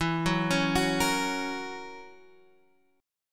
F sharp 7th, guitar

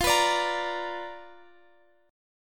B Minor Major 7th Flat 5th F, chord

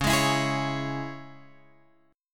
Db Minor, Guitar Open F; Dbm strummed; x,8,8,11,11,8; JGuitar

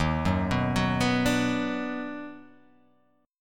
D sharp Minor Major 7th Sharp 5th

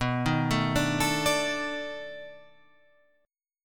B 7th Sharp 9th, down, Guitar, Open F; arpeggiated

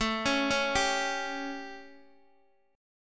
A 7th Guitar Open D, chord

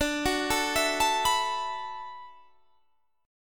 D 6th Add 9th D, Guitar, Standard; D6add9 arpeggiated; JGuitar

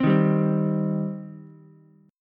C Minor C down Ukulele; strummed; JGuitar

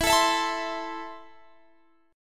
E Suspended 4th Sharp 5th, Guitar Open G; x,21,19,21,22,22; down

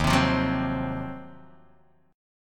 D sharp Minor 6th Add 9th, Guitar, Open D

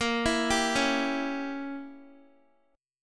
A sharp Diminished 7th, Guitar; arpeggiated; chordshape

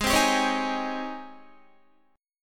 G sharp Major 11th G sharp, Guitar; JGuitar

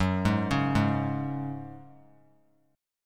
Gb Suspended 2nd, 3C,3F,3A,3D; Gbsus2 arpeggiated

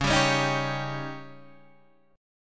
C sharp Suspended 2nd Flat 5th C sharp

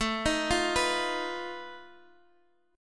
B 7th Flat 5th A, guitar chord

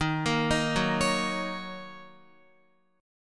Eb Minor 7th Eb Guitar; arpeggiated

